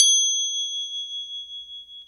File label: Crotales, Orchestral Perc